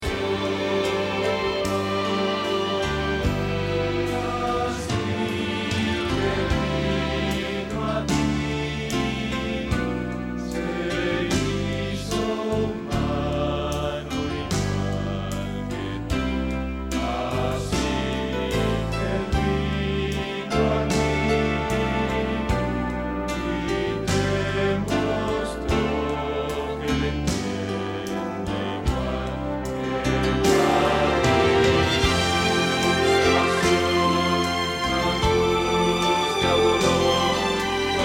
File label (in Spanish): Bajo